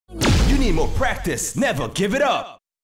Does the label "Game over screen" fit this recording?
Yes